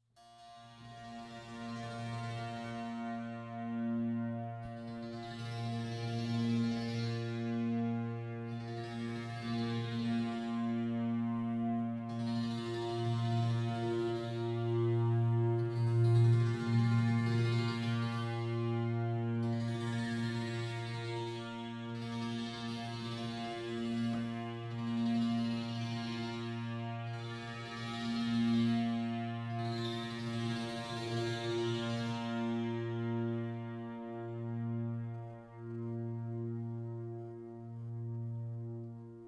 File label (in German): Hospiz Klangstuhl leise